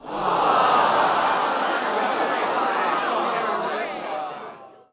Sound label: crowd sigh